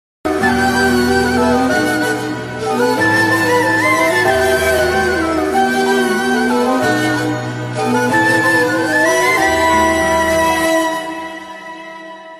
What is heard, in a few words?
sad instrumental ringtone punjabi song ringtone new ringtone